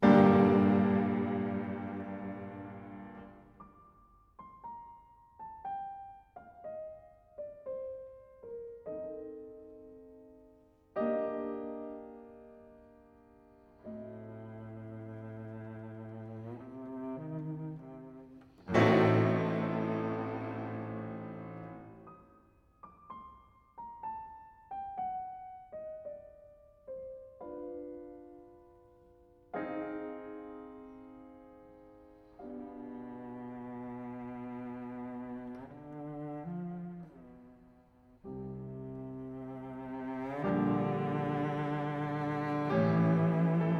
Sonata for Cello and Piano in G minor, Adagio sostenut